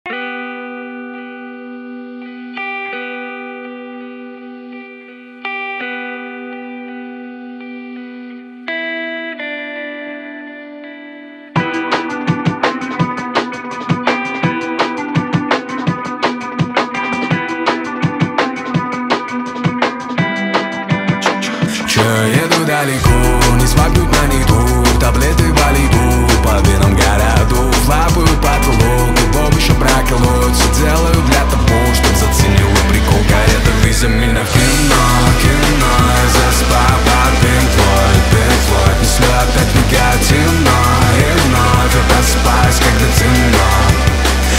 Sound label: LOFI